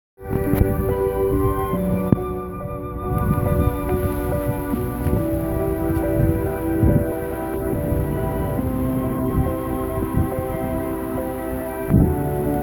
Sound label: Flute street performer by Notre Dame Basilica